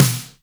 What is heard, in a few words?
smooth steel snare drum sound b key; Mono; snare drum samples